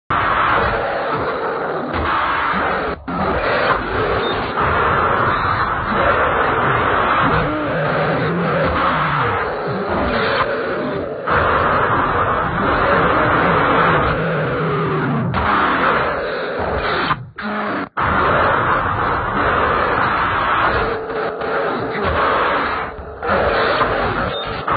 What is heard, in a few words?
radio; Milk outside a bag of milk... OST (2021)